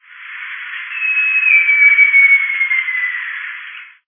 ariramba grande da mata virgem

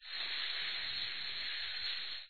choquinha-de-olho-branco

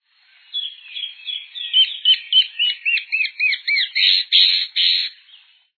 papa-formigas-cantador

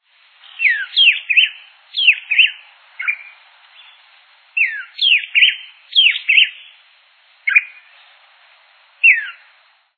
garrinchao-de-barriga-vermelha

